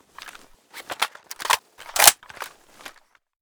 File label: scar reload, weapons